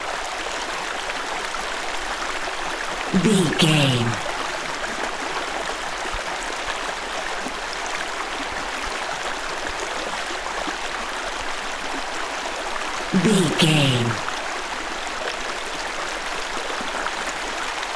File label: river stream night flowing water insects loop long